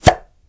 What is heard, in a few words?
Arrowstorm; rabbids multiverse; iOS, macOS, Mobile